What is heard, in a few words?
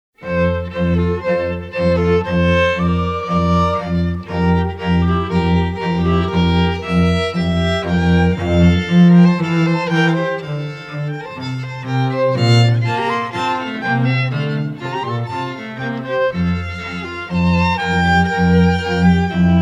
String Trio; Sheep May Safely Graze from Cantata #208